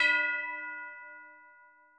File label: B5 bell